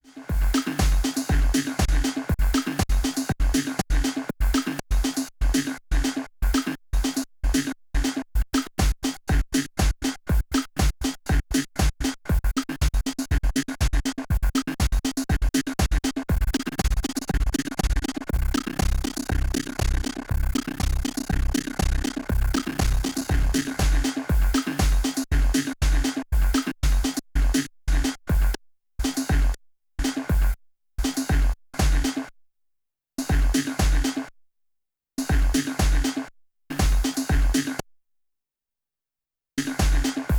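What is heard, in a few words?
Reloop RMX 90 DVS Transformer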